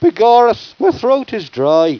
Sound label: dry throat; wanted